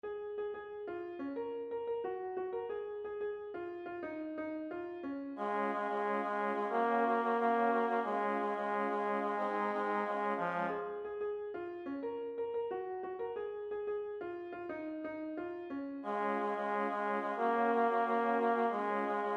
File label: Midi